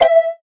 Combo

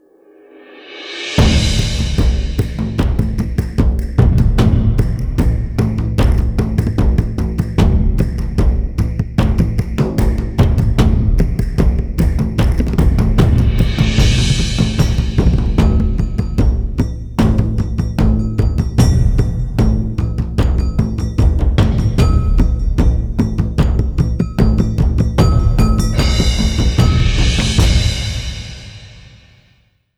Perc Bavarians

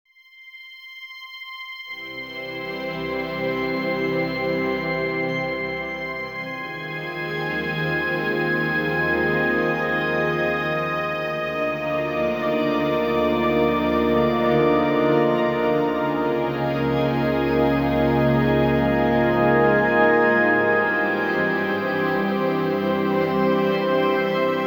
Ambient Funk

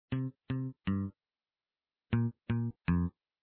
Intro Bass

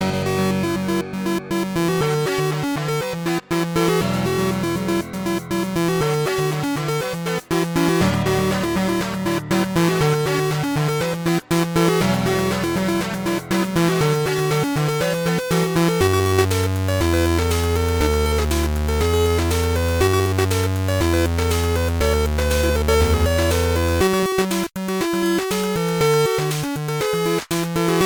Techno Stargaze loop; Panda Punch

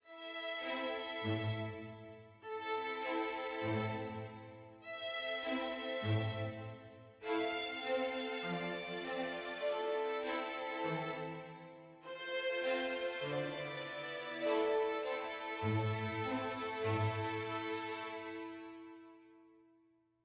adventure med; Quivr; PS4 Windows gamerip 2016

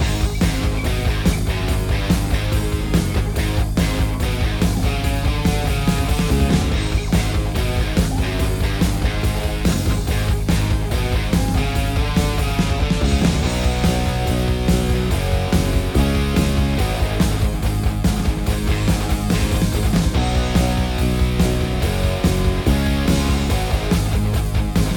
beat

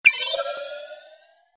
Melodic press; WeMod

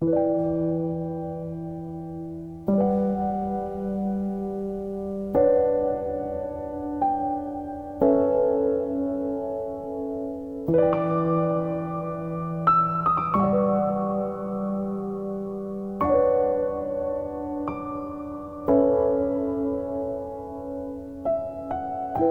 (Piano Version); Over Zone; Satellites

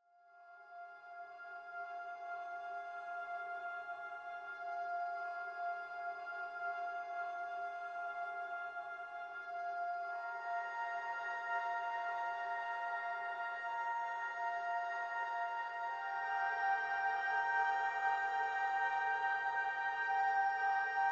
Gameplay Loop; noahmund

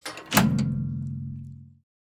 Blocker Open; Zuma Blocker Open; Bouncyboi in Puzzle Land

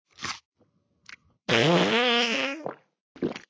Farting food swallow fart

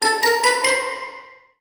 catch frisbee; Ringling Bros. and Barnum & Bailey; Wii

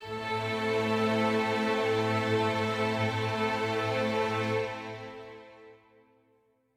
dark forte end

